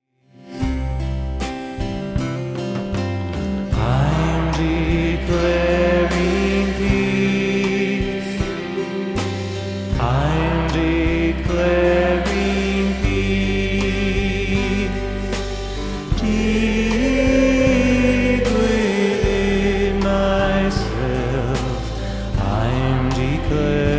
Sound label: Declaring Peace Chorus